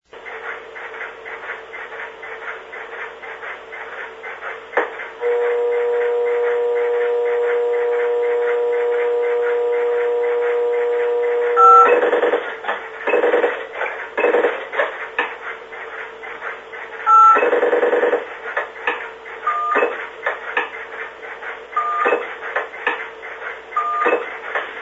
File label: Sounds of the Switchroom (while a call came in); sounds from preserved step by step switches; Phil McCarter